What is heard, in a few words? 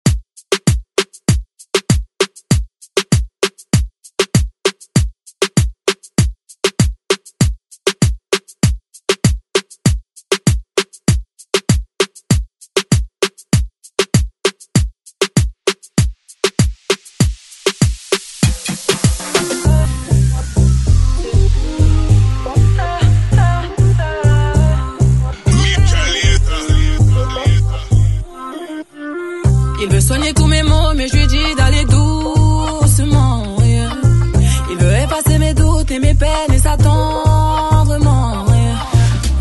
ReDrum; DJ Mhark